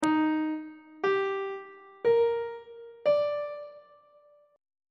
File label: b flat mixolydian 7th chord 4 is e flat major 7th; on treble clef